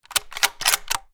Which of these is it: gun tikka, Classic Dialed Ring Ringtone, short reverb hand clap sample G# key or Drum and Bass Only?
gun tikka